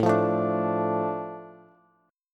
A Suspended 4th Sharp 5th, A, Bass; Asus4#5 strummed; JGuitar

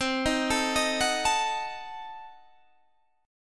C Diminished 7th C down Guitar Open G

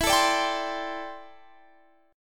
D 7th Suspended 2nd Suspended 4th E

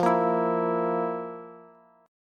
F Major 7th Suspended 4th, Bass; strummed; JGuitar

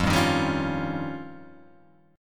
F 7th Suspended 2nd Sharp 5th, Guitar, Open G

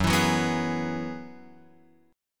Gb 9th Suspended 4th Gb down Guitar Open F, chord; Gb9sus4; strummed; JGuitar

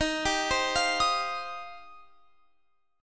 Eb 6th Add 9th, Guitar, Open F; arpeggiated

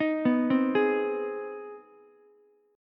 C Minor Major 7th Sharp 5th, Ukulele chord; arpeggiated; JGuitar

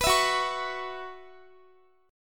Gb 7th Suspended 4th Gb down Guitar, chord

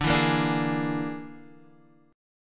E 7th Flat 5th, D

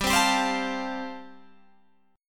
G 7th Suspended 2nd Suspended 4th G chord

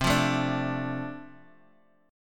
F 6th C, chord

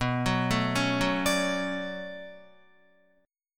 B-Minor 9th B down Guitar; Bm9 arpeggiated; 4F,4A,5C,5G,5C,6E; JGuitar

